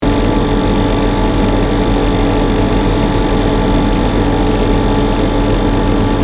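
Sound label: air conditioning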